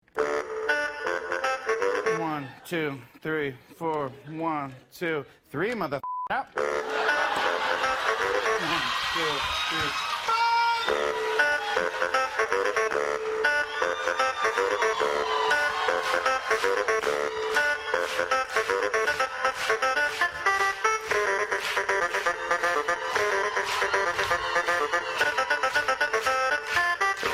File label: sax a boom, music